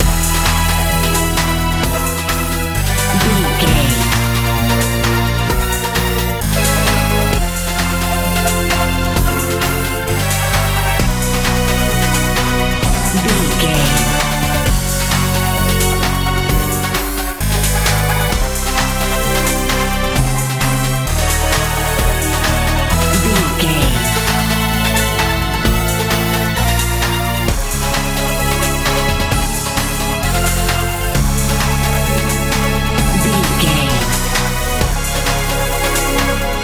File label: pop dance